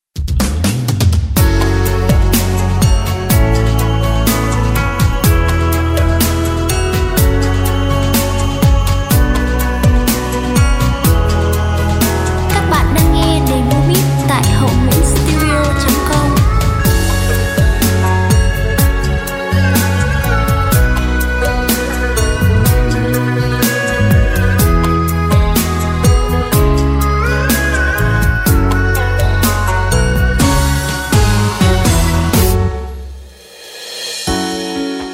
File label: beat, playback